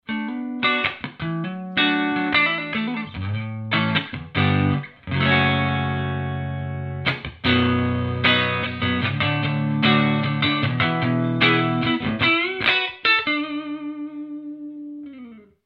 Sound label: clean